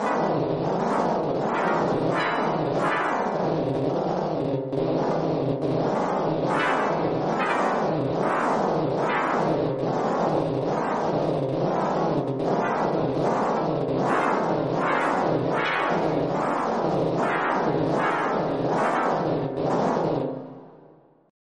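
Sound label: sunspot annual month, sonified solar data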